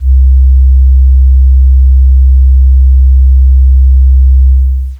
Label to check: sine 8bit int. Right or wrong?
Right